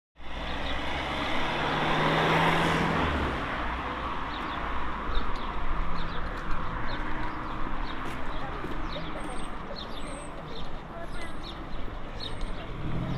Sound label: Harbour traffic; whitstable